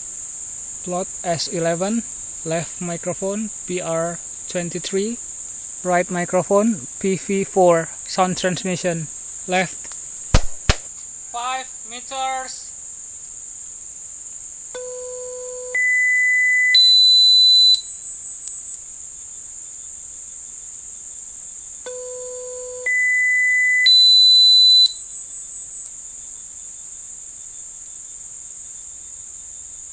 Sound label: birds